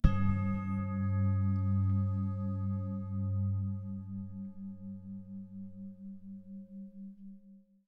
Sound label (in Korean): 싱잉볼 사운드